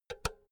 power cord heavy insert remove unsteady; objekte; elektronik

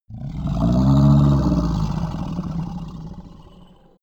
minotaur grunt short; kreaturen

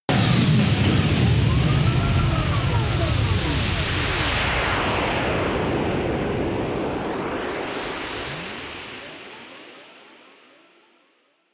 storm vortex, drones

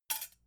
dough hook drop

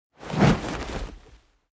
coloss movement swing whoosh; kreaturen

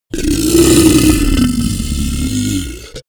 beast grunt, comedy cartoon; kreaturen; soundpool

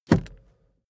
car seatbelt fasten soft

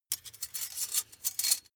midsize metal thin handle

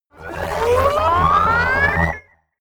wobble glitch riser short, sciene fiction

